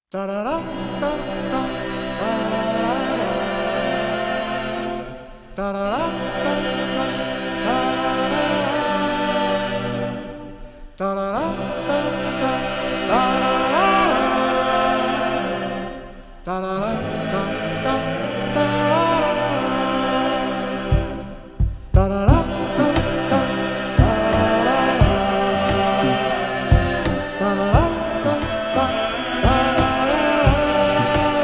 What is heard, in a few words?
Eventide Chant; Genshin Impact; Land of Tleyaoyotl